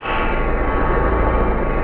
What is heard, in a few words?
robot